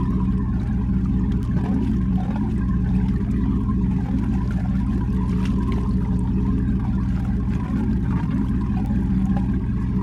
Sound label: vehicle mediumboat idle; teardown; ps5 windows xbox series xs